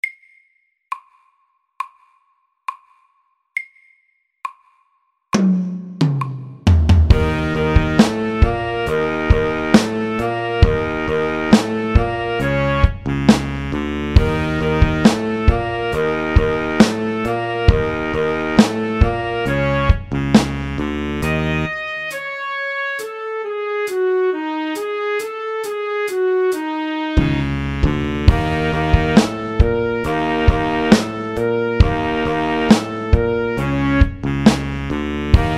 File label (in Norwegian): funk SAKTE